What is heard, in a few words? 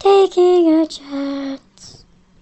vocal seed